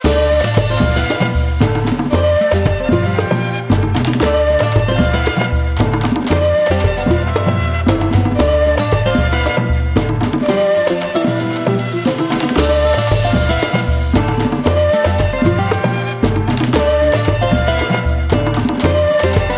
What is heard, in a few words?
Latin World Groove